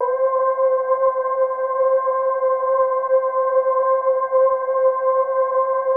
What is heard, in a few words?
GLAMA C4, SYNTHVOICES; Music Store - Claudius Diemeter Schwerlos